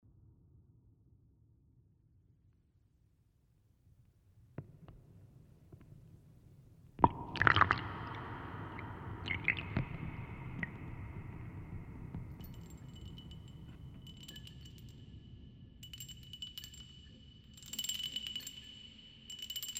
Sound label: Gallery Workshop Soundscape